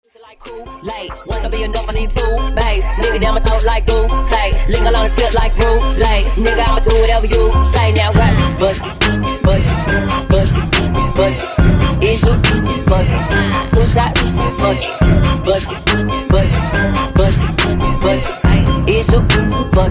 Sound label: Funk MashUp